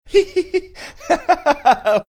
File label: laughing